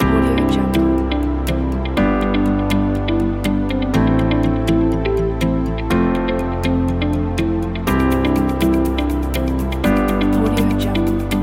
upbeat motivational music

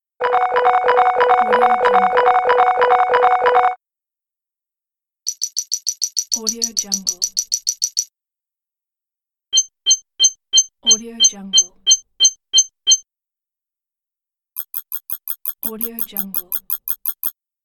total points won

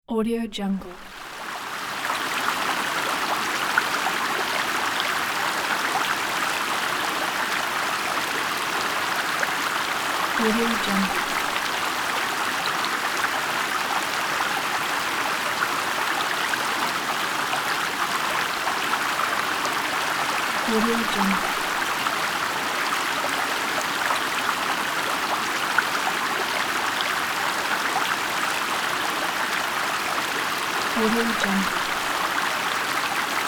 river flow

